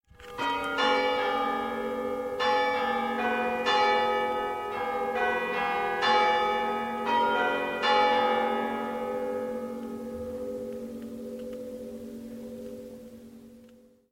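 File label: hour, chimes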